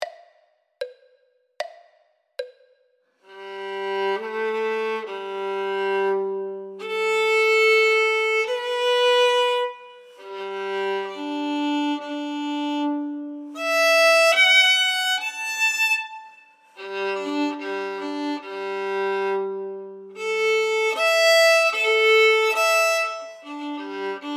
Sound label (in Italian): violino I e II